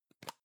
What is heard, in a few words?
plastic can lid screw open soft; objekte; dose